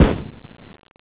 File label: rifle